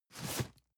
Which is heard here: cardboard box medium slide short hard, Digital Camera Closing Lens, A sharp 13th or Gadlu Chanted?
cardboard box medium slide short hard